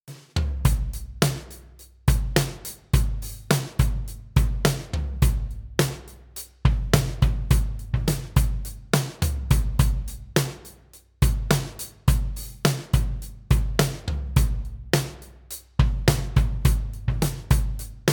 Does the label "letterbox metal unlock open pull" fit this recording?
No